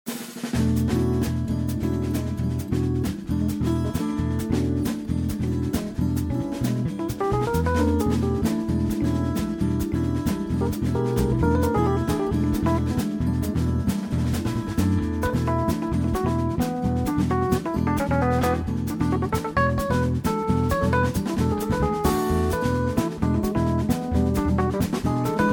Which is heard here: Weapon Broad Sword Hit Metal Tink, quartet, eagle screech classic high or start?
quartet